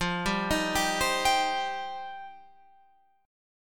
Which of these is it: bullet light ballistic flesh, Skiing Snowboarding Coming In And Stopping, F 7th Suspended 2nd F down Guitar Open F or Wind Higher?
F 7th Suspended 2nd F down Guitar Open F